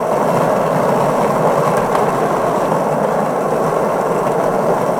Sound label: skate